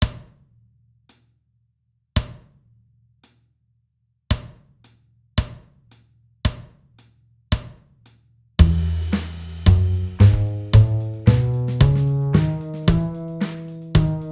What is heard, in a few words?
Scales Concert E